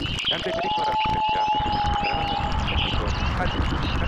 ambient mix

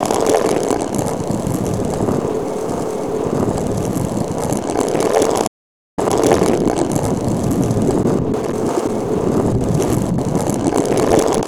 Resynth Stone Roll

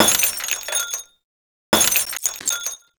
Resynth Glass Break

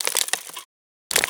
Stone Break; Resynth

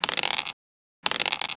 Resynth Wood Roll

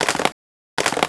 Resynth Wood Break

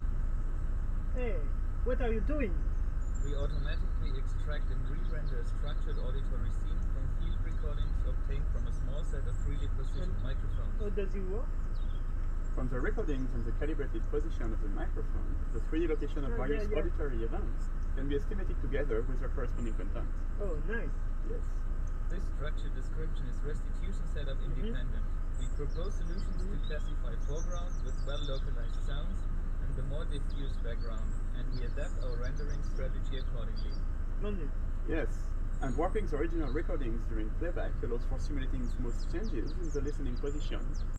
Outside

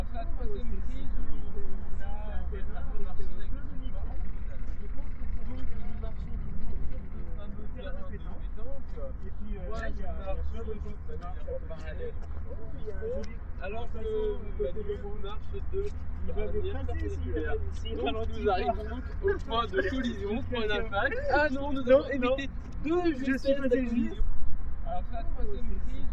petanque

